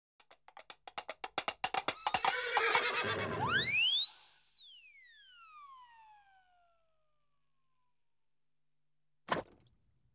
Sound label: Animals, Thrown From A Horse; BBC Sound Effects Library